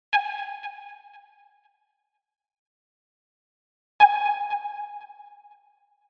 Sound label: Sonar Ping